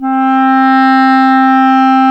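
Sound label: wind; Roland - LA Composer vol. 3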